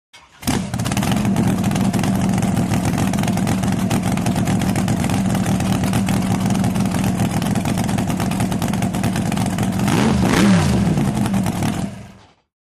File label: Drag Boat Start idle